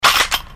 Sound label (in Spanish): recargar